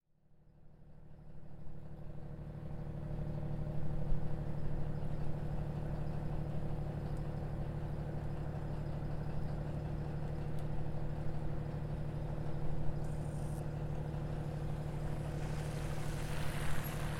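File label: warnowpier diesel locomotive; 101207 rfh warnowpier diesllok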